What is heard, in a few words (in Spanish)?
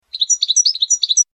floreo tirochi tirochi; campero